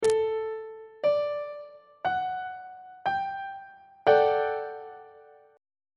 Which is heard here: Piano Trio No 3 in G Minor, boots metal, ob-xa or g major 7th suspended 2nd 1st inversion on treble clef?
g major 7th suspended 2nd 1st inversion on treble clef